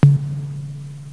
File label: Drum